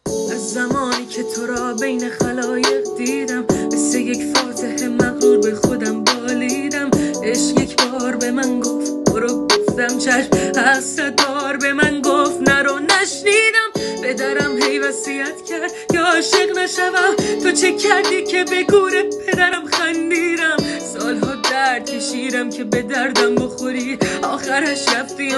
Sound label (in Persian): music ghamgin sedaye zan